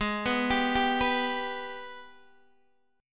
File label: Ab Minor Major 7th, down, Guitar, DADGAD